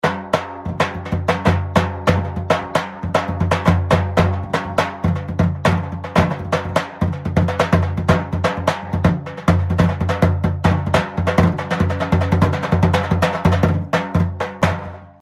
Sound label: TAPAN